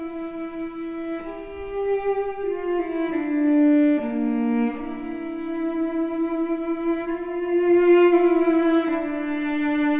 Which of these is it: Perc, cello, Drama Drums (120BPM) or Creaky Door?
cello